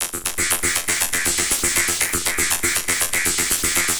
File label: Beat, Industrial; Sonic Foundry (Sony Creative Software)